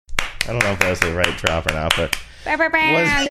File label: clapping making air horn sounds saying i dont know if